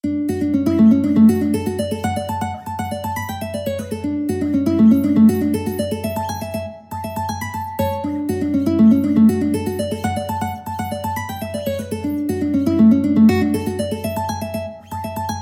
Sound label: Hornpipe, mandolin